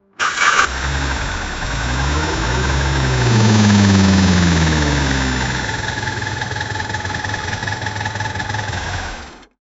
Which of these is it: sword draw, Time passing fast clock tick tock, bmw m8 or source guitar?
bmw m8